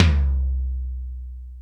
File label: Toms 1, drum machine